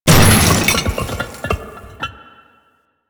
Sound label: Loot Crate Large; Warframe; Entrati